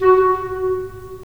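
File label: wind clarinet; LA Composer vol. 3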